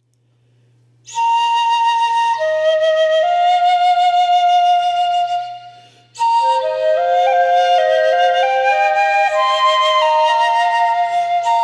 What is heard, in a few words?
Fue; Music, Jazz, Solo, Performance